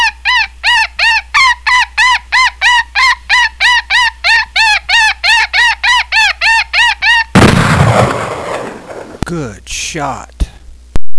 hunt, coyote; JS512 electronic caller

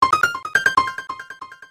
piano

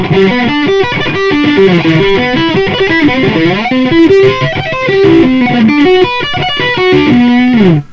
sweeps; Picking Techniques

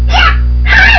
kick; Kick Throw

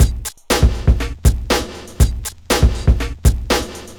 120 beat; JUNEBUG BEAT; drum loop

